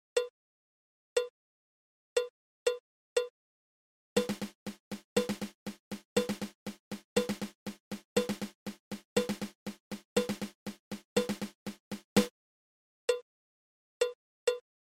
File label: Single Dragadiddle